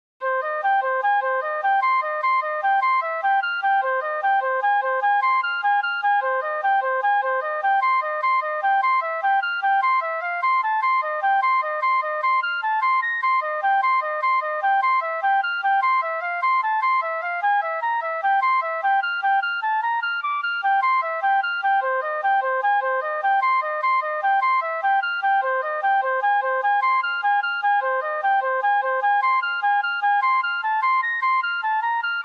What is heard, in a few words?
flute; fractal music